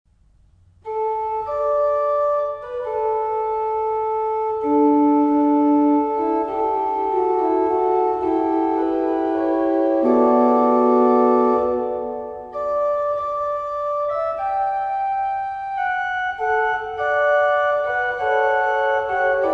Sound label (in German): Orgelvorspiel; Hörbeispiel "Wunderschön prächtige" GL 842 / GG 948